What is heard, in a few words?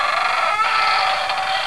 creek